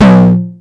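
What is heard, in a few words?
tom distorted hi, Yamaha Motif ES-Series; 300 Drum Machines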